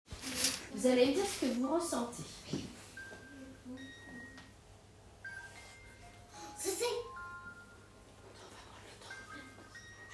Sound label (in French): musique boite a musique